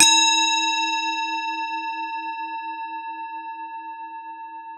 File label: tibetan bell